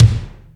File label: Lotsa Kicks, Drum Machines